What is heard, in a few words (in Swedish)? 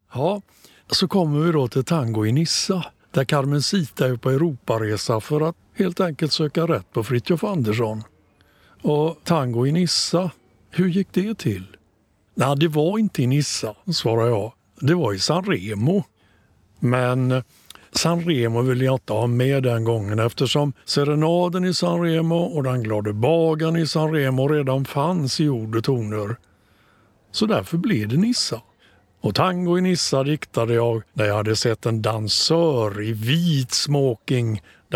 text